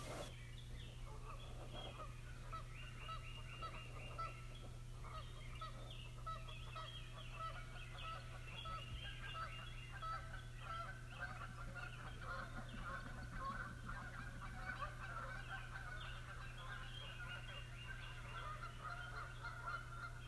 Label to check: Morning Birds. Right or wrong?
Right